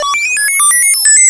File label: NOISE